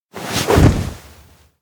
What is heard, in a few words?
lift put on bike; anemoi